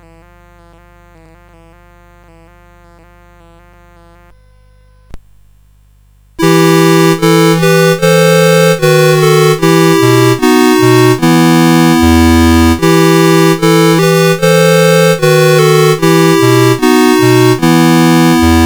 Good Night My Baby, CSG8580R5

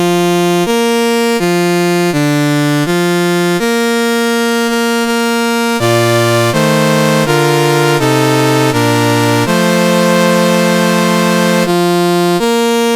Australia, National Anthems, CSG8580R5

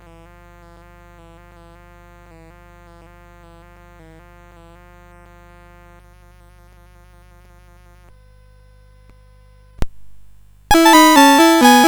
Poloniase; CSG8580R5; from 6th French Suite

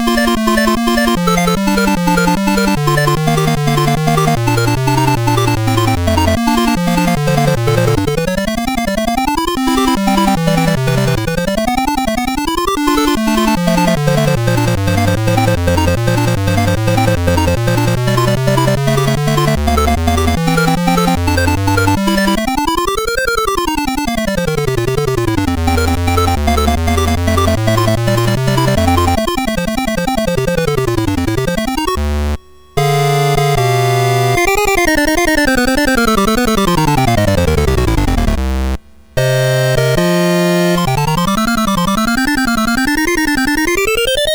Prelude XXI in B Flat Major, MOS6581; MOS6581R2

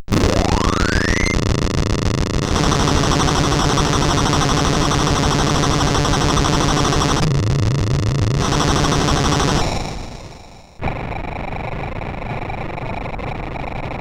Die Alien Slime, CSG8580R5

